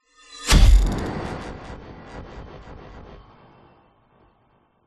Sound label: sound design cinematic impact futuristic sci fi; zapsplat